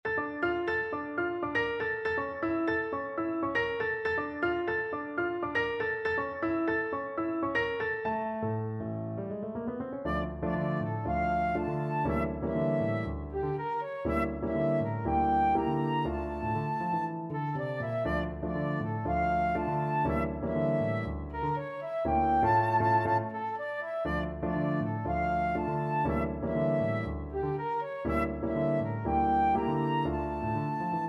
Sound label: salsa flute